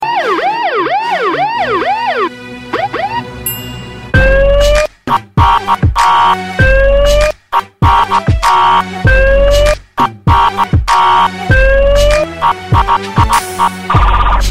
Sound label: police siren loud tone